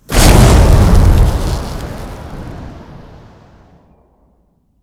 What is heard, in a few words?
shout frostbreath